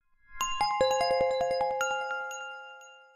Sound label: valley iphone 15